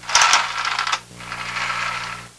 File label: blind down